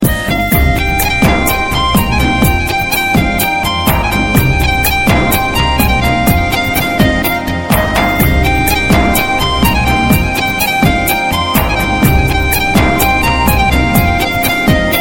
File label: tone, ringtones; blues